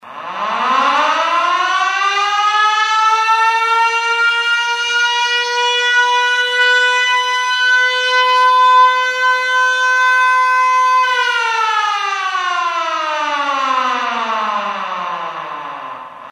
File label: Air Raid, ringtones funny